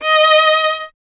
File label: STR VLN, Vln Detache; Miroslav Vitous